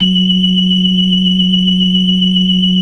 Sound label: BLOOD L, ORGAN; Keith Emerson Signature Series